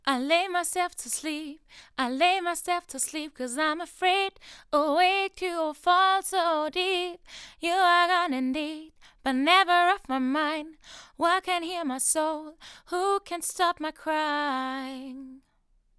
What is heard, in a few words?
vocals shure pg27